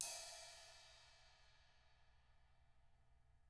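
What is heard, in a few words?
CRASH 16, CYMBAL; CYMBAL KITS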